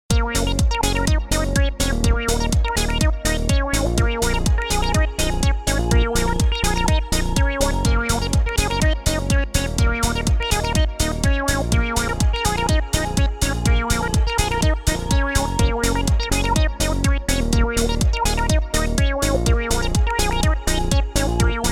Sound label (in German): Behringer Kobol Vergleich Kobol MS20